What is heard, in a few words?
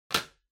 Uchiwa Put